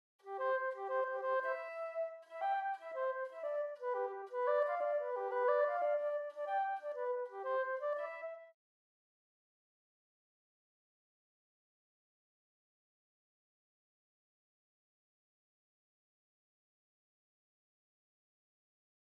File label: Flute; Overture or Something